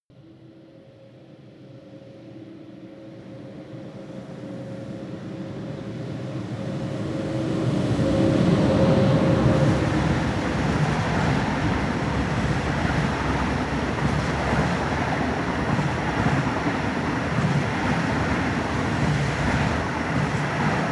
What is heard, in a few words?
train freight drive by